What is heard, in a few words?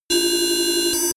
alarming computer ringing